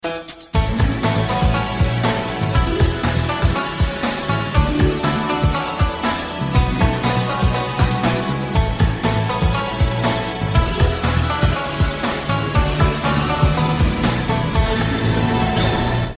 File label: EBU signature tune